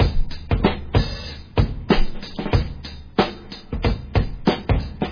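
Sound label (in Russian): барабанный луп хип хоп олдскул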